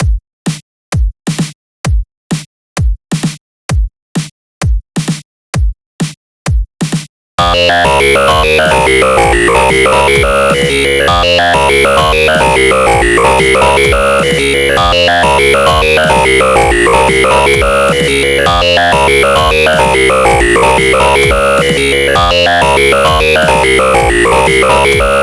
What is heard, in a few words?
Dubstep